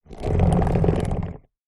household chair sofa drag concrete wooden legs scrape